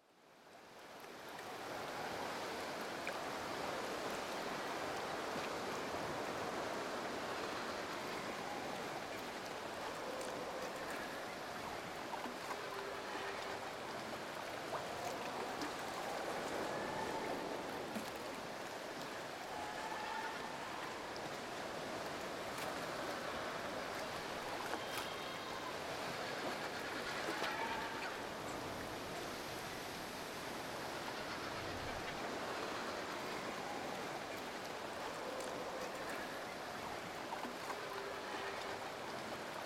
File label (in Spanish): MAR ISLAS BALLESTAS DESDE LANCHA ZONA DE CUEVAS